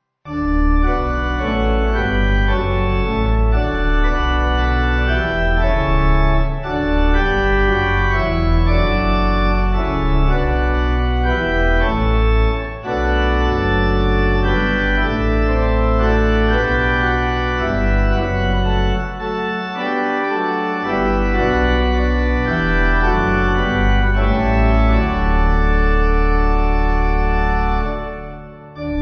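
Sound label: Pipe, Pipe Organ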